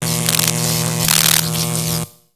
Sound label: Robot Electro Arcs; creatures mechanical; Lego